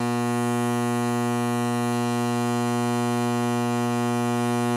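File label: Electric Arc Buzz Loop; buzz & hums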